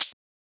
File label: Shaker; FLPRO